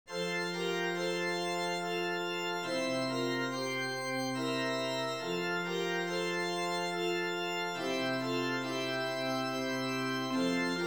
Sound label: Amazing Grace With Accomp, handbell